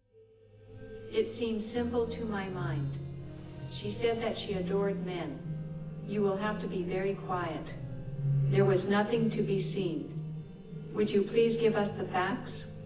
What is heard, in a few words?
gitar